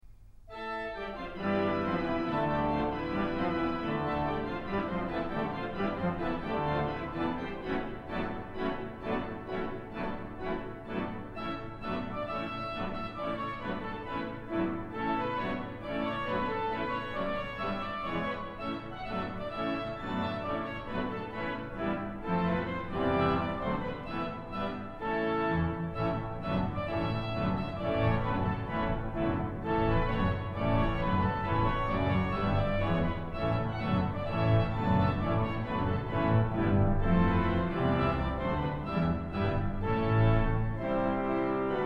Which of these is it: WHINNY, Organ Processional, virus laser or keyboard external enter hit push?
Organ Processional